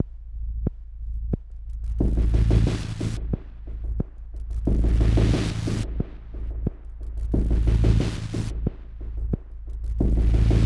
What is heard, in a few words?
Tape Spun Loop 90bpm; Angel